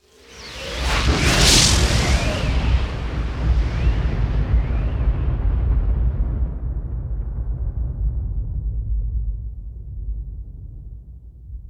Explosion, Electronic Vortex; gun and weapon sounds; captain audio; fully loaded

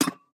Peg Leg Footstep

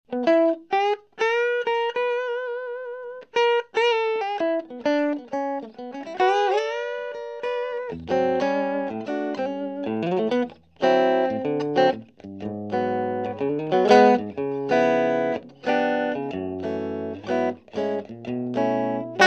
Electric guitar - clean sound (mono); Alan Parsons & Stephen Court - Sound Check 1993

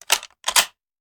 Rifle Foley; Sound Ideas; Guns

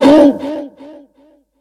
Hunger Wolf Death; Kilta

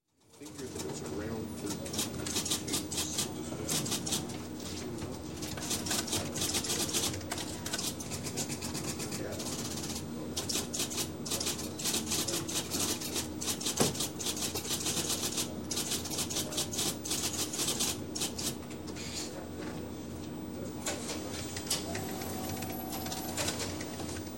Office, Ambience Voices, typewriter, adding Machi; Phone; Sound Ideas; Series 2000

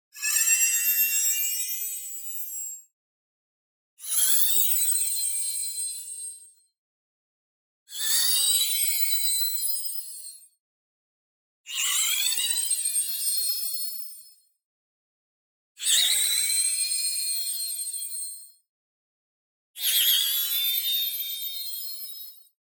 TONAL Small Riser; boom library; Anime Essentials

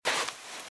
Footsteps - Sand - Dry - Walk - Right

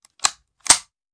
Machine Gun Foley; Sound Ideas; Guns

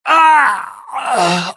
male pain death; firefight

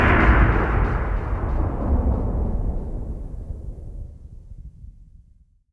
THUND; XXL 800 Production Effects

